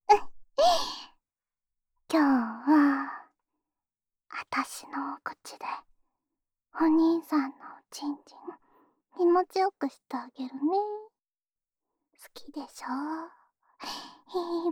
オナサポ用フェラチオループ, ASMR

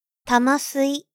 玉吸い, ASMR

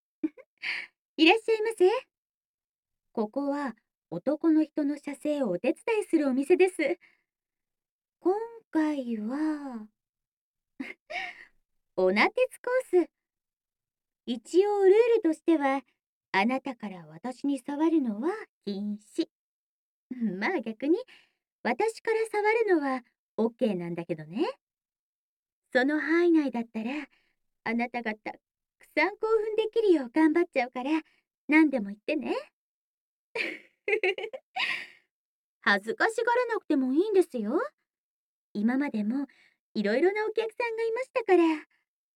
ゲップ; ゲップオナてつ編

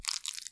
浅めにストローク, ピストン運動 激しめ

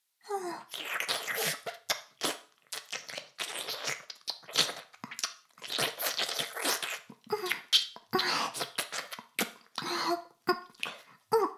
耳舐め音声 (LR逆); 古き城のドラゴニート2

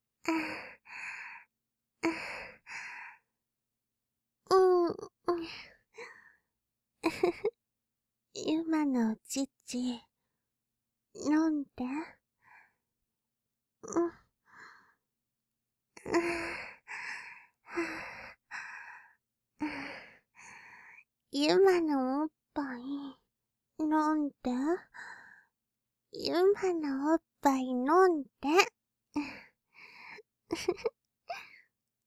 喘ぎ; 由真

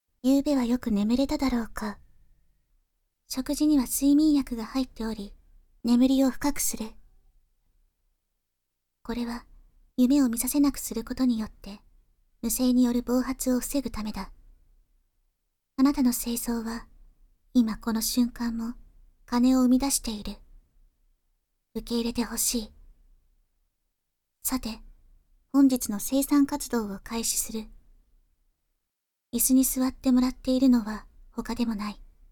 耳舐め 効果音なし, ASMR; 生産工程2

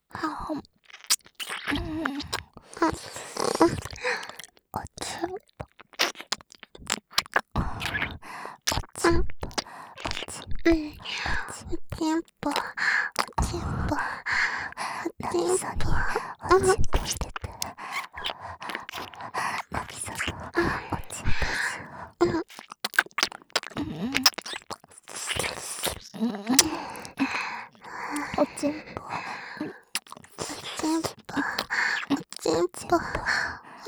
耳舐め; お屋敷メイドのハーレム淫語ヘヴン 気が済むまで耳舐め淫語を堪能できるバイノーラルオナサポ音声

